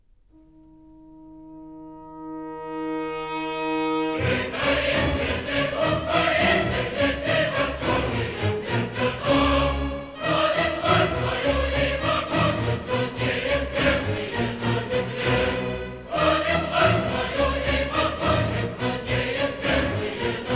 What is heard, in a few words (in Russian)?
хор; Лель